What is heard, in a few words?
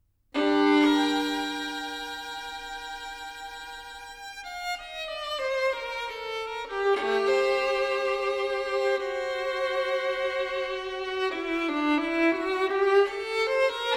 violi